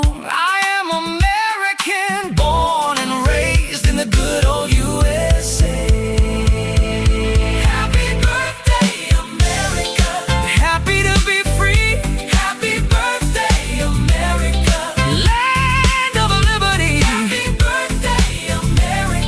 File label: Smoove Jazz Birthday Party; Happy